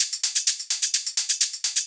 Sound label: Hihat Loop 128 BPM